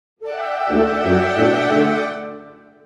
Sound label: Cartoon Orchestra Run